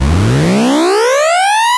synth efx rise

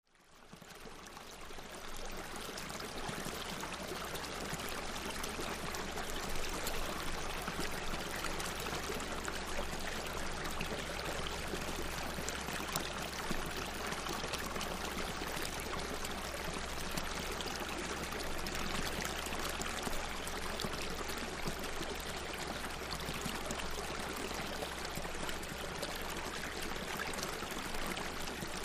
Water Flows Over Rock, streams small

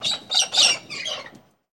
Cockatoo Roseate Agi

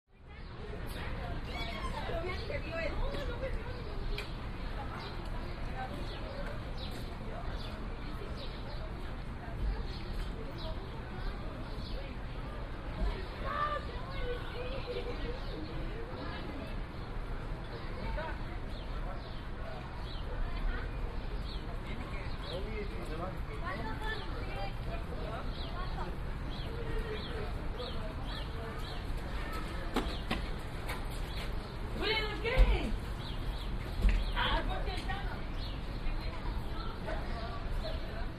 High School Campus Ambi, high school exterior; High School Campus Ambience With Sparse Student Voices And Birds

